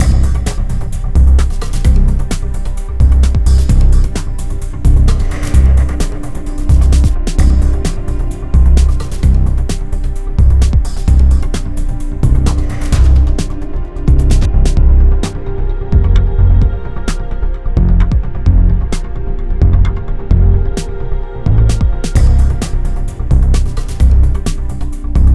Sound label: Tense